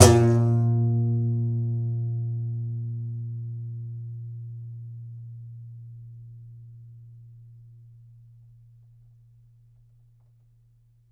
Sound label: C. Bass Balalaika, Plucked Strings